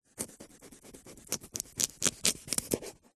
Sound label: food sweet potato slicing with large knife